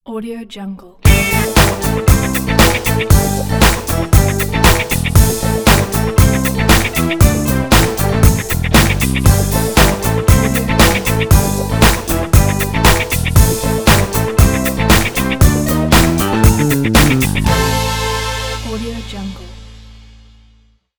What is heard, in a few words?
funk logo; crystal-logo-studio